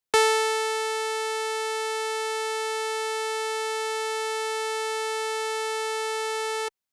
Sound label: saw